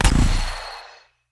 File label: dark flute